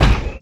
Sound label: heavy grate, footsteps; player; heavyfeet; Dystopia